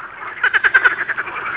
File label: ridibunda, waterfrogs